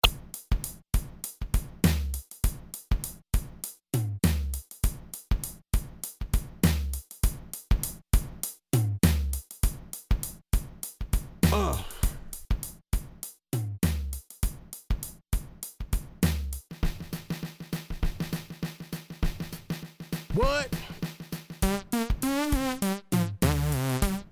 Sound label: Frog house music; big beat, synthesizers, frog vocals, vox snippets, and an accordion style coda on the Moog, at 100 BPM